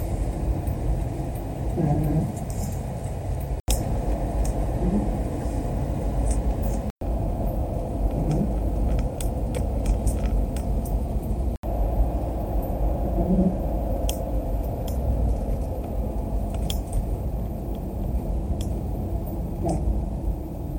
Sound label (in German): Bremse, Prius